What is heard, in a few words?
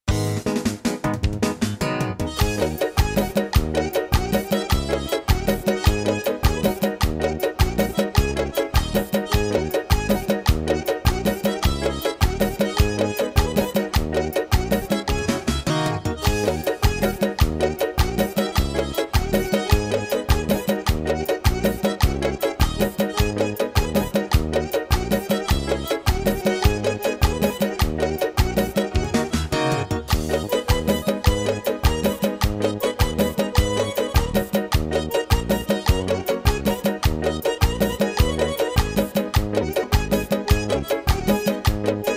armenian rabiz